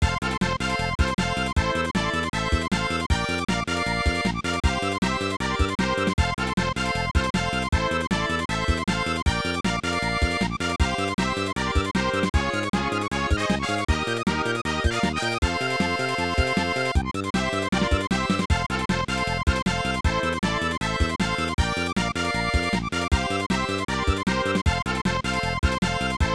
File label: Victory!; SD Gundam Gaiden 2 Entaku no Kishi; SNES